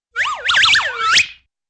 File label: whirly twirly zip